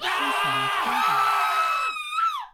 scream; BALLY; TWILIGHT ZONE; Pinsound

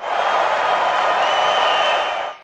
crowd winning; BALLY

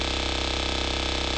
tilt door; sfx

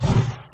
hurt; WILLIAMS; INDIANA JONES; Indiana Jones Original

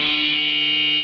alarm; BALLY; CORVETTE

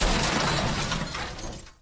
crash; BALLY; TWILIGHT ZONE; Pinsound

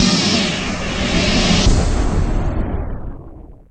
ending, sfx; BALLY

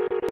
abort beep; sfx

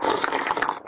brick moving; sfx

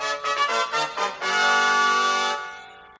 mode end, jingle; WILLIAMS; INDIANA JONES; Indiana-Jones-Original

